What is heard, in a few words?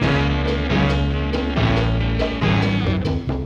riff